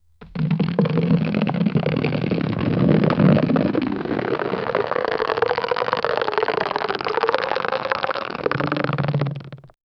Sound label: a large balloon that is